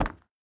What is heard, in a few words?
boar footstep wood hard; Rust